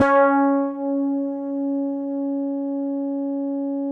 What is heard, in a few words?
MIDI FRET C#4, fretless bass; Masterbits CLIMAX 7